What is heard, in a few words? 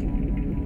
whirring, moon